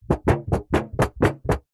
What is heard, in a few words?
metal tin lid flex bend; zapsplat